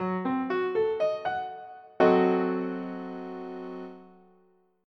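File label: C dim, guitar; GUIROOM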